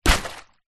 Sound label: plastic bag full of water set down hard on wood surface; foley